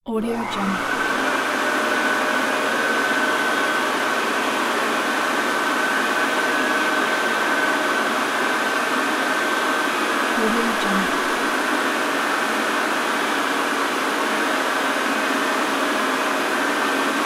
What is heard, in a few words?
hand dryer